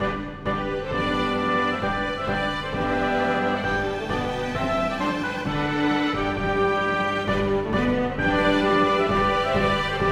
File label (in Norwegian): Intro for blåsere, strykere og trommer; Bang